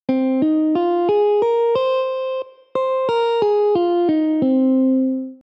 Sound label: Phrygian Pentatonic